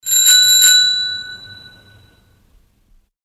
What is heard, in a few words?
Bicycle bell